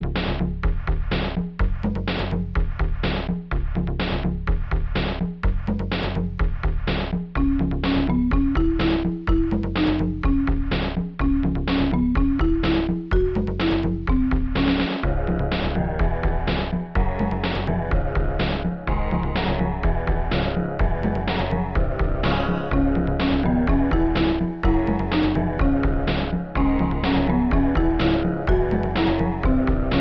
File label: Bongo